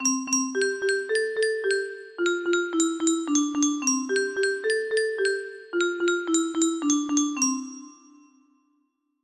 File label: music box